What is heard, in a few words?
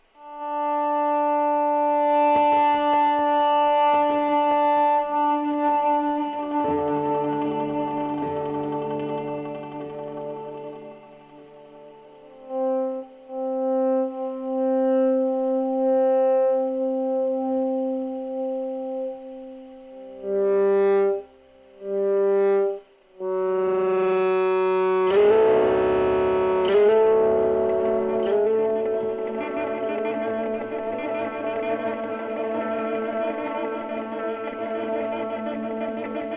G drone gtr